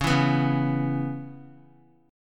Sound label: Db Minor 7th Db down Guitar; strummed; 4E,4A,5D,5G,5C,6F